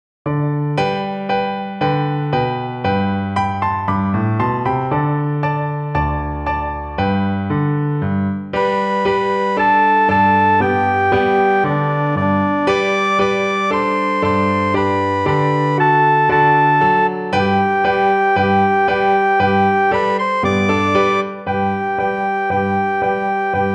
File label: Trumpet Tune trumpet; Mozart